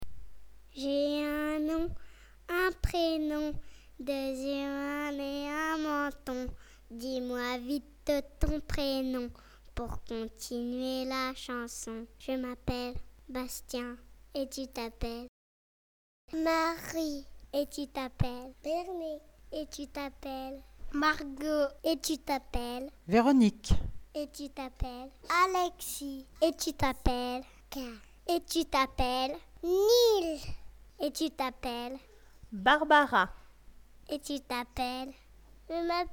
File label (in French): chanson pour se presenter